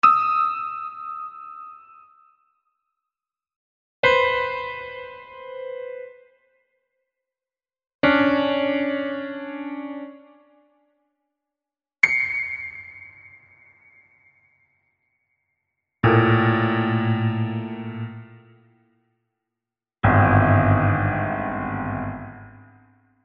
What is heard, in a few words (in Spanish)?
Ejercicios de Intervalos simultaneos segunda menor en varios registros